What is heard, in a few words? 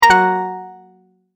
multimedia tone end; Tones; Sound Design; McKinney Sound